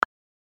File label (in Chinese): iOS13 空格键